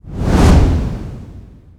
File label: fire swoosh